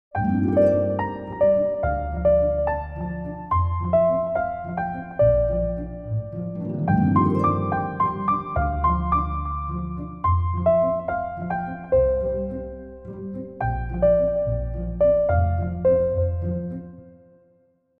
melodie basse

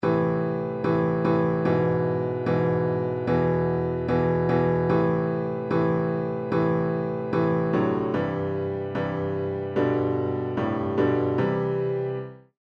CORDES

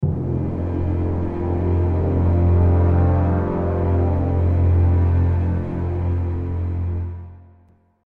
attaque grave timbale

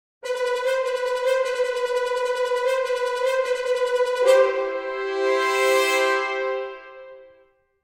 staccatos cuivres

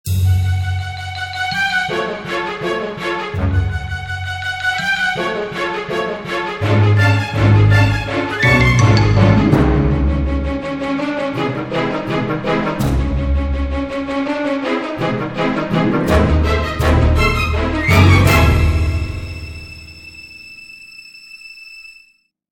avec harpe timbales

